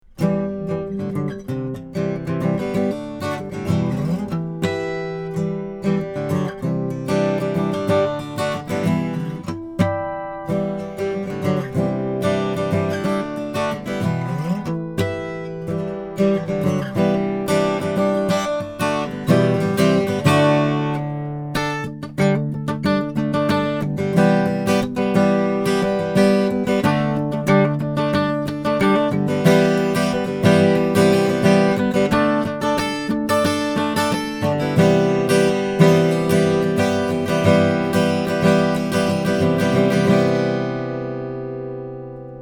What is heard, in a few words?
Harmonic; AMI UM25 Proto